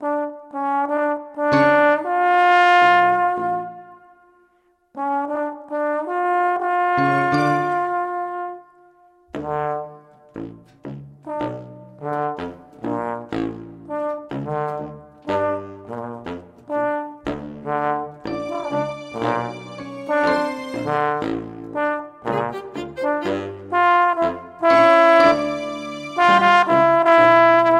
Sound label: anothersadsong impro